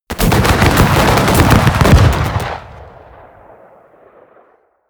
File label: volley large group close